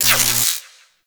Mag Spark; Archwing Intro; Cinematics

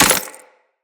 Melee Impact Glass

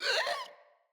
Infested Runner Pain

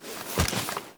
Idle Spin; Warframe; Yareli

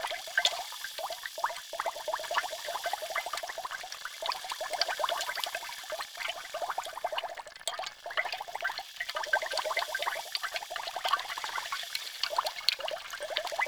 Cage Bubbling Tube Loop; Ambience; Props; Tagfer; Warframe; Entrati

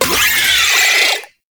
Suicide Scuttler Pre Death Vocal; Enemies; Gameplay; Boss; Warframe; Oraxia

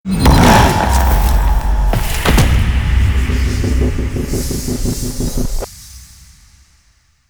Mech Destroy Heart; Warframe; Cinematics; Deimos; Deimos Quest

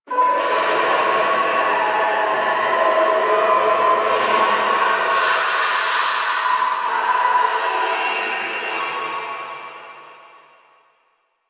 Distant Sewer Grate Screams; Infested; Warframe; Ambience; Nineteen Ninety Nine

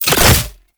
Fuel Projectile Drop; Warframe; Jade Shadows; Ascension

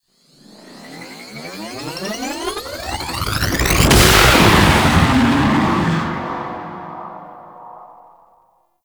Ship Take Off; Liset; Warframe; Guild; Capture Objective Room

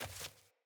Sandals Walk Dirt; Player; Footsteps

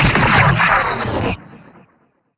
Death; Warframe; Raptor; Battle Barks; en

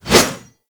Pole Arm Whoosh

